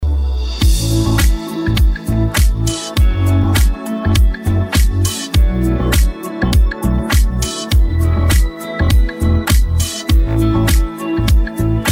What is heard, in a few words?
Karaoke Song